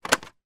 leisure board game pen push into plasic box case holder